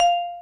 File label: Bell F5; osu